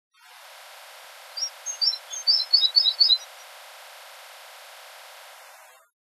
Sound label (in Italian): Balia dal collare